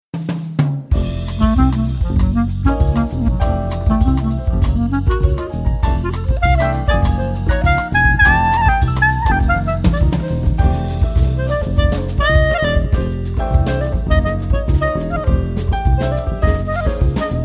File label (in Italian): jazz bossa quartetto sax